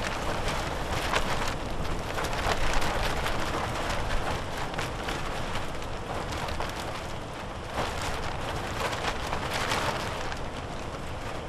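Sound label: tarp movement plastic close; Titanfall 2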